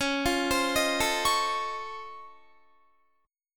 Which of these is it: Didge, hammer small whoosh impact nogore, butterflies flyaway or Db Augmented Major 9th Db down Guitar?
Db Augmented Major 9th Db down Guitar